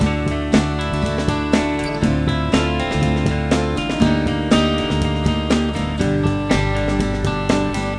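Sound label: Loop, synth fx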